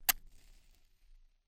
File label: front right, binaural recording; theverge